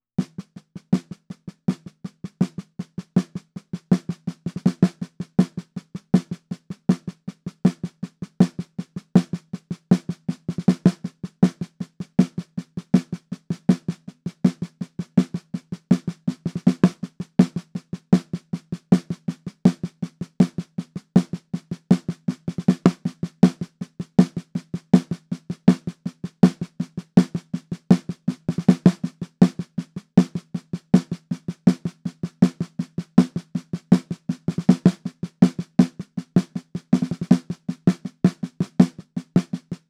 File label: perc intro snare